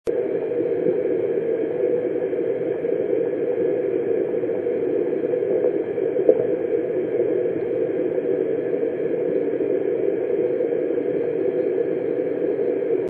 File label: Steel Leak